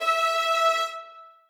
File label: string